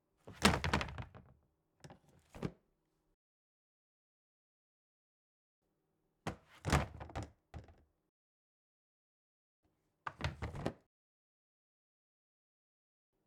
Garbage Can Open Close

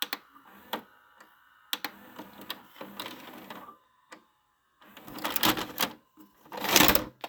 VCR Stop And Eject

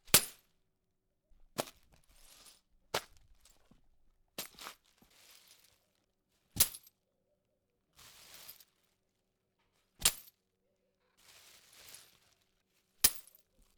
Glass Bottle Breaking

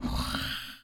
Roar

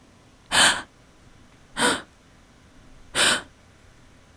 Woman gasp

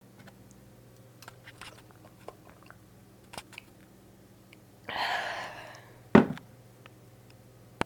Drinking

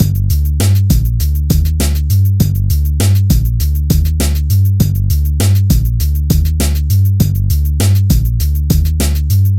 Loop With Bass

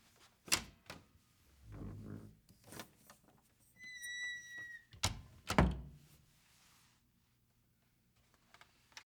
Old wooden door

